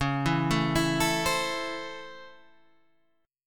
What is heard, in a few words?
C sharp Minor Major 7th Sharp 5th C sharp, chord